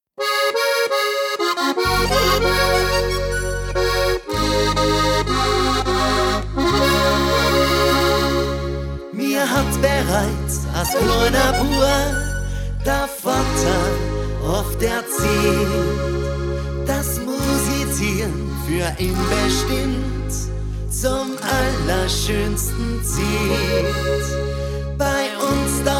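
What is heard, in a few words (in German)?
mit Chöre ohne LEAD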